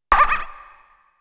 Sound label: Footstep